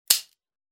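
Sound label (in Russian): холостой выстрел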